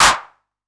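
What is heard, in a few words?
808 clap; PERC TR; Wizoo - Platinum 24 Electronic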